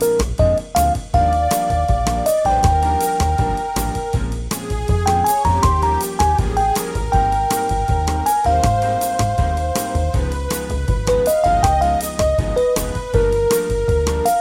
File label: bgm; 4-in-a-row